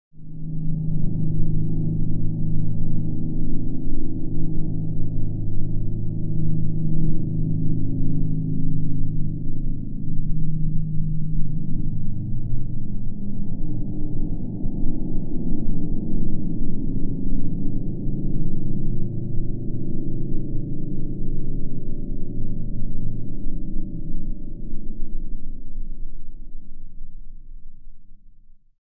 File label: Bed Alien Womb Low End